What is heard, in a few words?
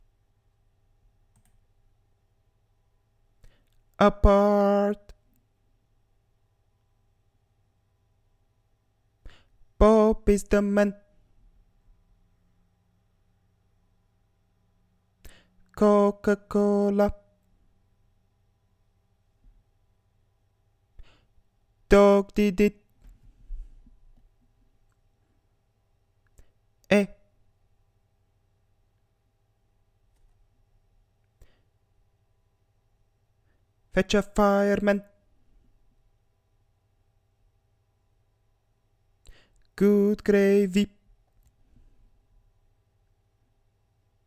morse